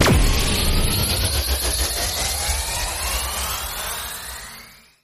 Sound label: spin